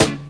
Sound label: SD, Dance Mega Drums; Best Service